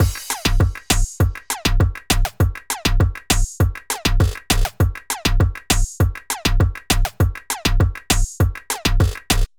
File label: full drum loop 100bpm